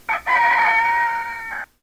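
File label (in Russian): затихающий петушиный крик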